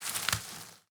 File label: pick plant; Rust